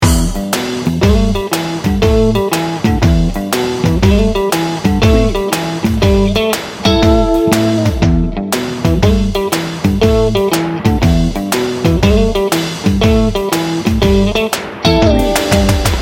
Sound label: quirky loop